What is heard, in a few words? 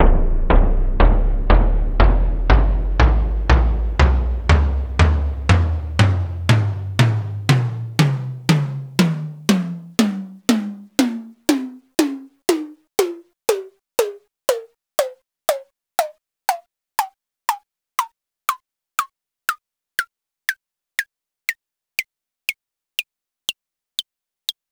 melodic tom tonleiter